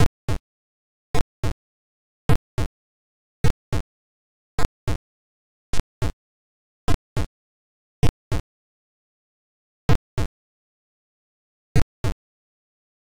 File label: Heartbeat; IMP